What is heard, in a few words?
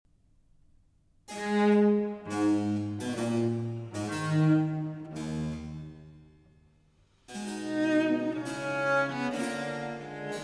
Sonata For Cello and Continuo in A Minor; Vivaldi; R.43